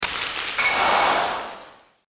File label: Hockey